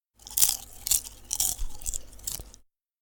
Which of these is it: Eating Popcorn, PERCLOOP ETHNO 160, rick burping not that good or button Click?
Eating Popcorn